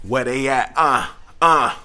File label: Rap Vox